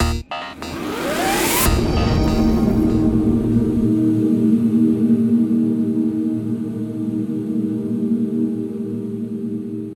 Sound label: Transition